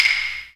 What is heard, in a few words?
CLACK, JAPANESE PERCUSSION; AKAI S6000 CD-ROM - Volume 5; Ethic